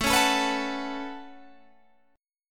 A 6th Add 9th A down Guitar Standard; A6add9 strummed

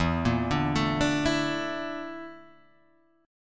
A sharp Major 7th Flat 5th E

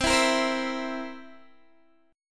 Bb Suspended 2nd Flat 5th

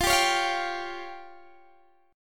F Suspended 2nd Sharp 5th F down Guitar Open D

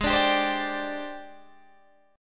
G sharp Major 7th Flat 5th Guitar Standard; 16,17,17,17,15,15; JGuitar

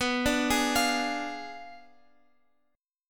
B Diminished 7th B down Guitar Open F; arpeggiated

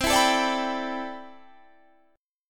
A Minor 11th C, Chord

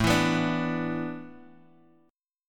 A Suspended 2nd Suspended 4th, Bass Standard; Asus2sus4 strummed

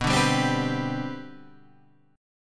G Major Add 11th, B, Gadd11/B chord; strummed; JGuitar

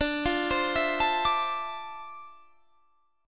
D Minor 9th, down

